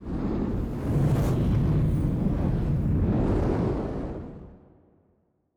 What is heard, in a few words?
kaiju superweapon fire swirl; biogenesis